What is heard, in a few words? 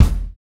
kick, house